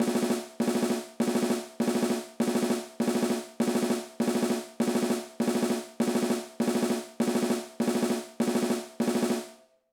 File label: Hand Exercise